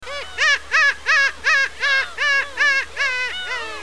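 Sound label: black-tailed gull; umineko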